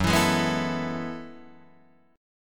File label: F sharp Minor 11th, Guitar, Drop D